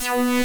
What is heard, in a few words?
POISON BASS; Soundengine - CSR-000 The Art of Transwaves